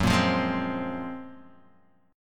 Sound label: Gb Major Sharp 11th Gb Guitar Open G; chordshape; down